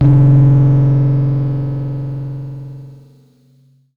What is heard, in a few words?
Simple OSC Spring Reverb